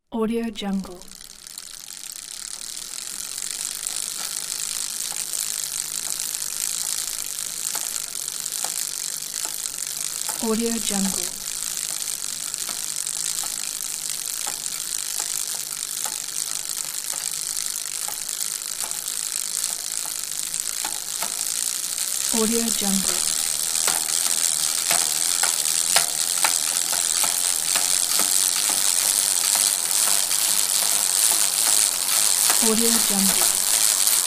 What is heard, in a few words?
bike